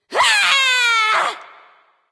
battle cry; smite; voicepack

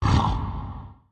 lobby ox grunt; smite; gilgamesh

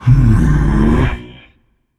sigh, voicepack; smite; jorm

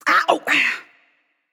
NV Ouch; smite; awilix

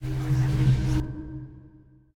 Idle Hover Magic; smite; izanami

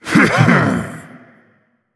heh heh; smite; janus

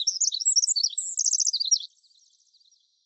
ambience good bird; smite; conquest

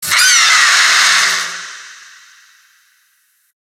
rebirth screech, phoenix; smite; npc; grkconq phoenix

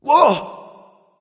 nv breath; smite; voicepack; xbalanque

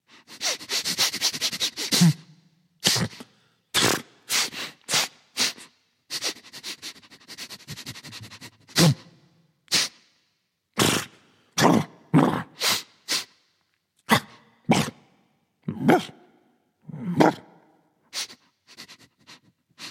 snuffling; fenrir

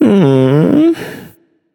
sigh, voicepack; smite; jorm

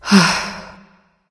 nv sigh; smite; awilix

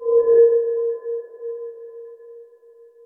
projectile; smite

